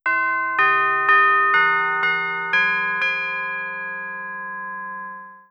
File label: chord Glockensp, Deurbellen; 500 serie